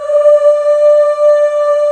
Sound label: CHORUS D4; AKAI S-Series CD-ROM Sound Library VOL-8